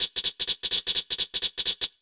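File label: 127 drum 2 R, Fresh Disco House; USB Soundscan vol.20; AKAI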